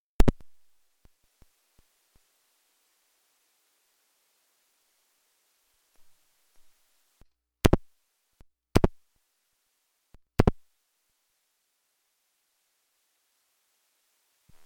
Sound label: Cobra Cb Power On Digital Noise, Ham radio electric tick noise; Noise & Static